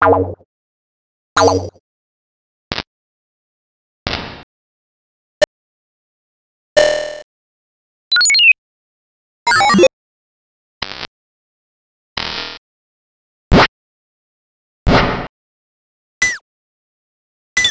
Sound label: FX Percussion; X-Static Goldmine 5